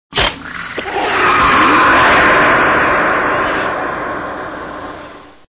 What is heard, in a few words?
predator howl